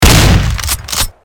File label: Police Sound Gun